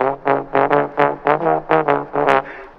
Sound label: horns, jazz loops